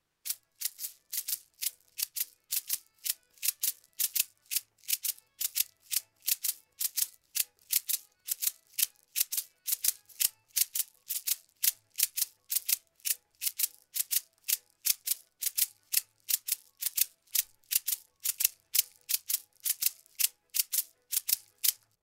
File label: maracas